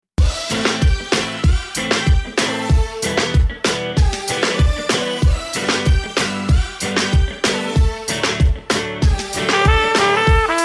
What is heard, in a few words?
Reggaeton